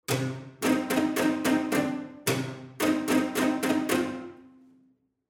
Strings 6 Ci Bartok Pizzicato

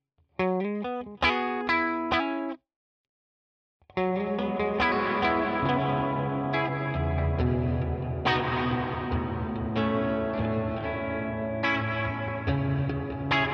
REVERB Hall long; Walrus Fundamental

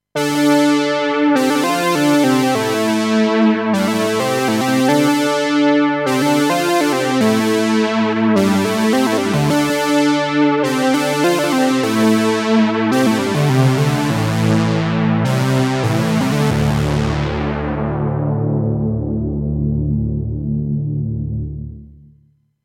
Fat Intervaller

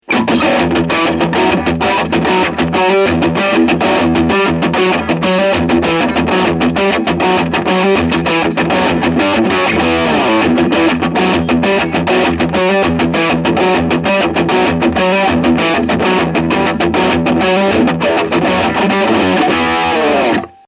Overdrive

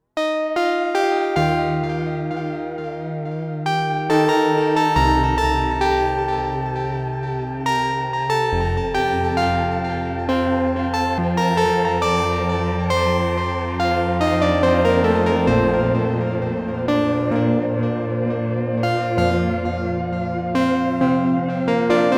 Dual Piano Delay Pad; Super Gemini

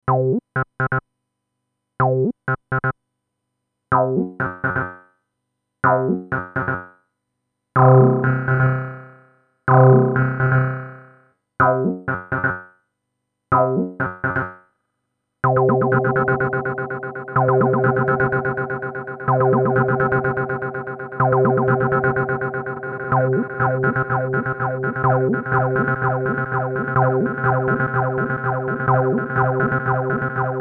Roland TB-03 Digital Delay

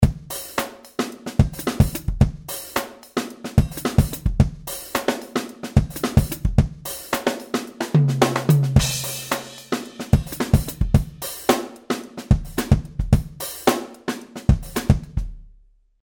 Mellow Kit; WFL

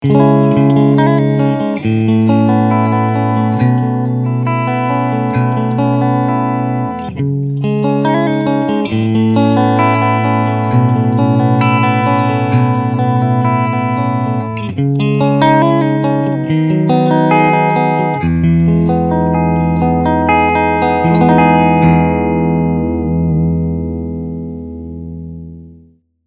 Ch1 Clean

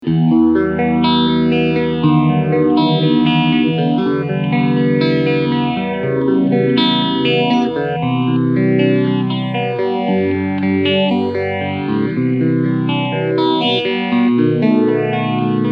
JHS Pedals 3 Series Phaser Feedback OFF

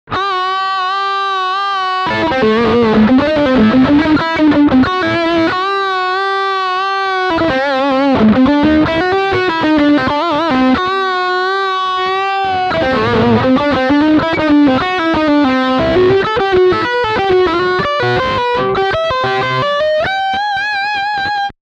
Lick Bridge Humbucker

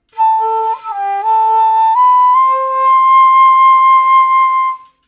Flute Dry

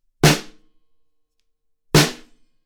snr silk over; Portico 5024; NT3

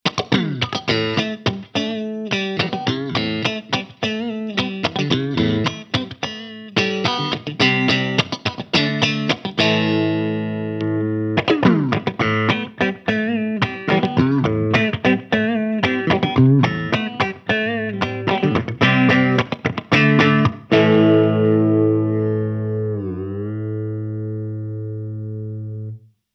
ESP LTD Eclipse Clean; ´87 Rainbow CRK

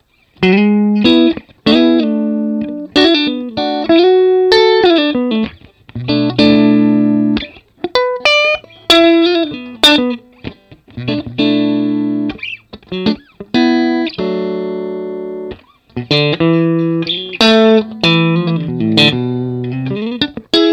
UAD OX Amp Top Box

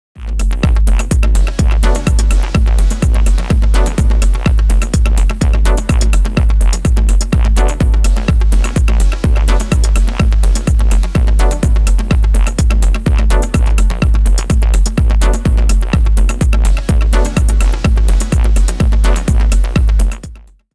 House dry; Gyraf GXXIV Test

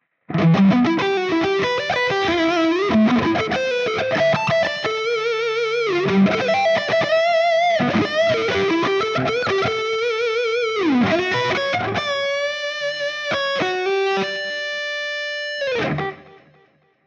Epiphone Les Paul Neck Highgain Lead

